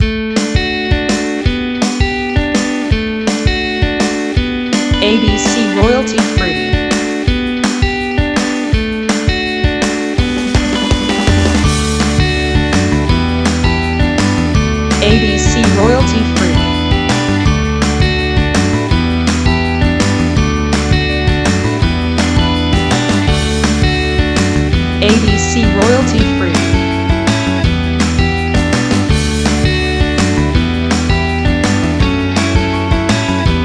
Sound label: Happy Commercial no whistler